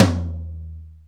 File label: Toms